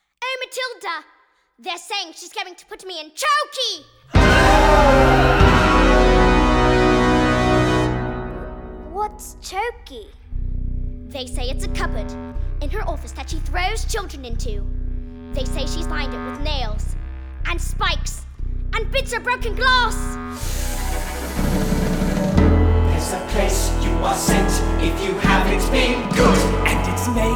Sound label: the chokey chant